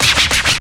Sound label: SCRAT; E-Lab; X Static Goldmine 2; akai